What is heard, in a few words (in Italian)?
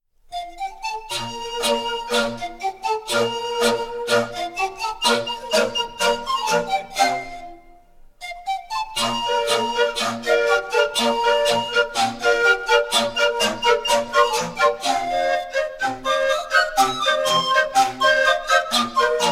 firlinfeu; brianza